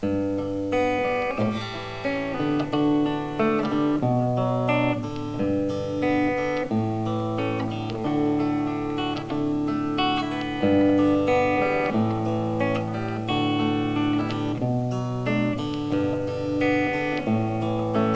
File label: 31 band eq